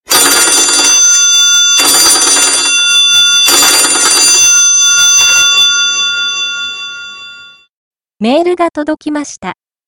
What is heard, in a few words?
ring mail